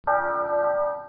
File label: bell MID